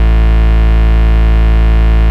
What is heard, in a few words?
Bass Root A